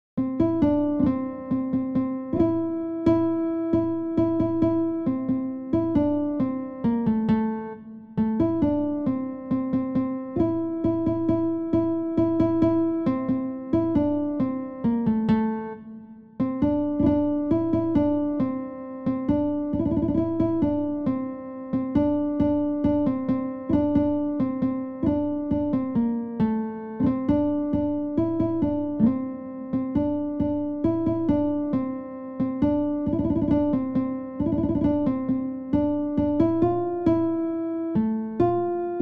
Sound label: santoor